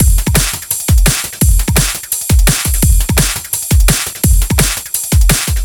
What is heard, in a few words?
Stick Kit, 170 bpm